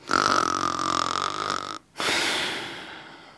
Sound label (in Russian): tyzheliy hrap